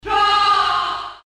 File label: grunt; ultraman taro